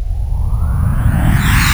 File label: HIT